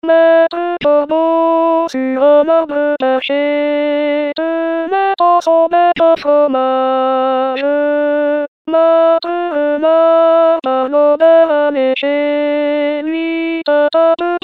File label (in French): Alto, Chorale